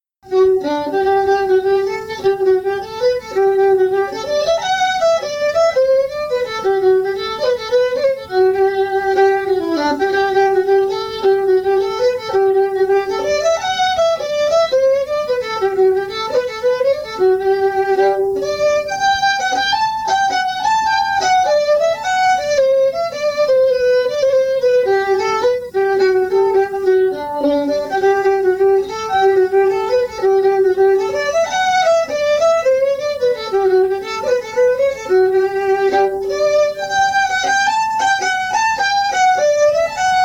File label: fiddle; humours of bandon